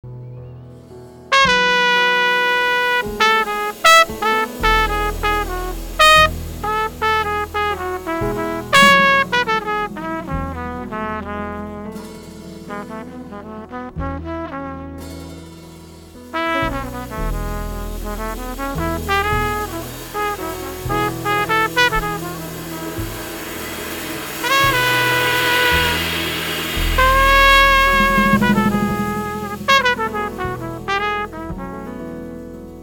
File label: drone